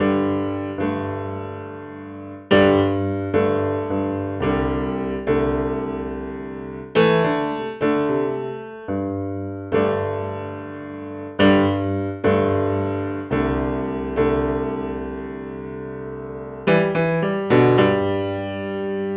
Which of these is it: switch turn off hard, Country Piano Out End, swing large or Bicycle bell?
Country Piano Out End